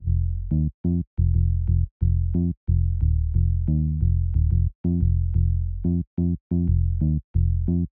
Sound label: BASSLINE, BPM 120; baseline junkyard